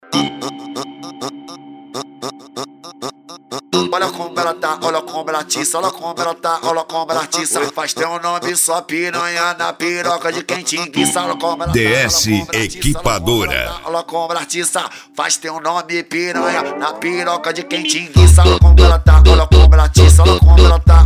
funk grave

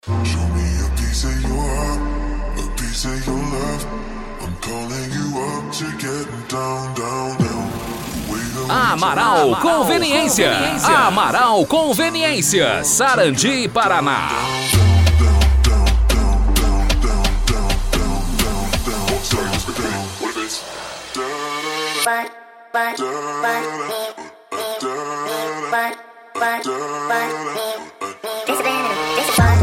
funk e sertanejo; amaral conveniencia; sarandi pr

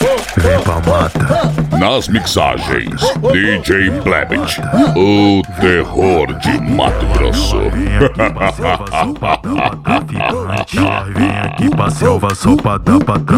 funk sertanejo

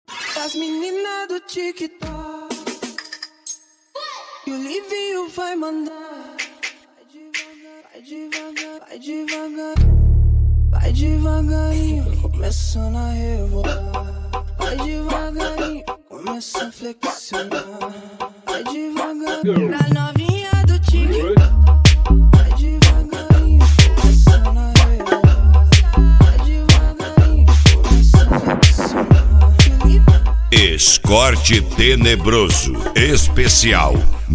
mega funk

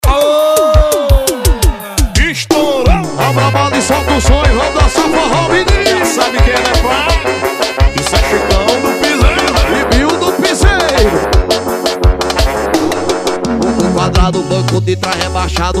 forro

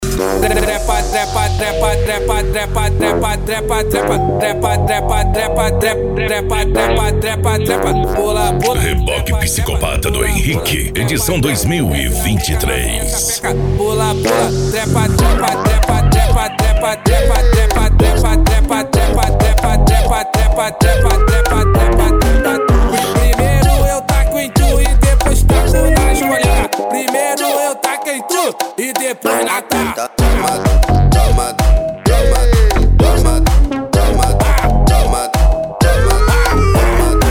eletro funk

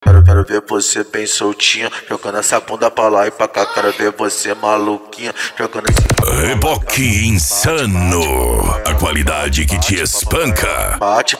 noia dance e funk mega bass

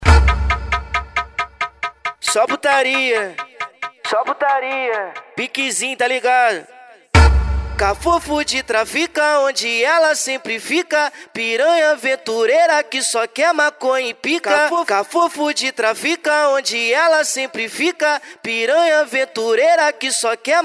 funk agressivo

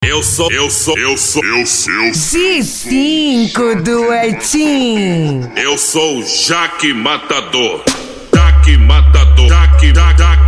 mega funk e funk mega bass

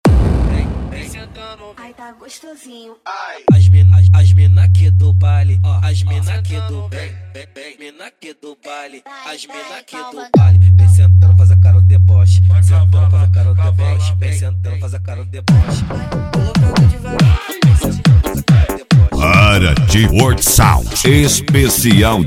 esp pancada

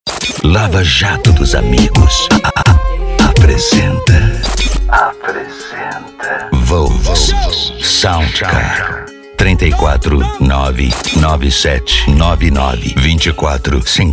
abertura, sound car sertanejo; cd volks sound car

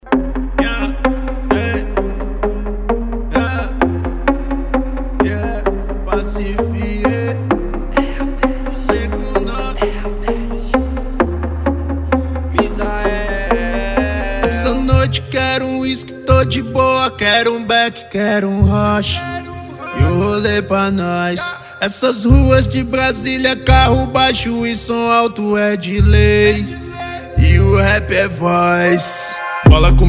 hip hop nacional

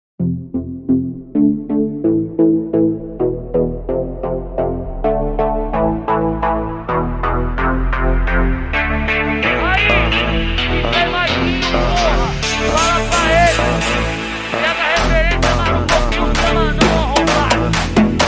eletrofunk; som automotivo

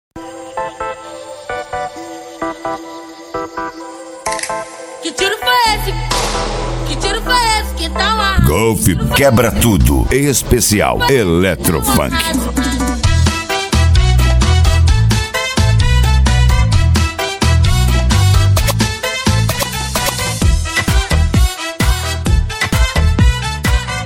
eletrofunk

